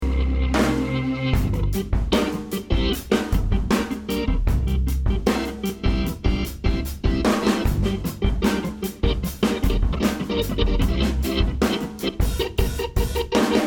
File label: drum clip